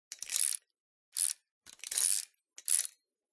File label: micro mechanisms cryptex disk turn; silverplatter audio